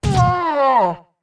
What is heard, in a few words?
Goron Link Fall Down